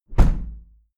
window plastic squeak close heavy

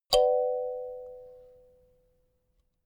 notification kalimba triad minor high

